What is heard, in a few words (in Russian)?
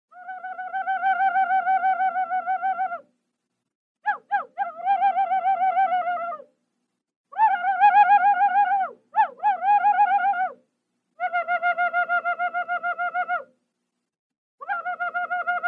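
возгласы индейцев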